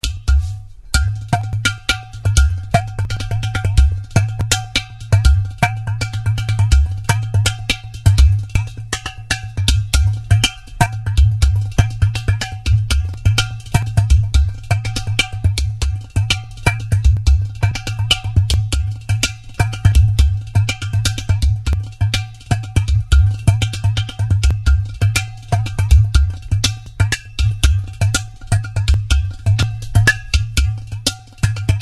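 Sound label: gatham, tambours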